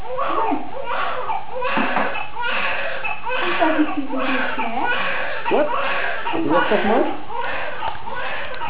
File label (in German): erste laute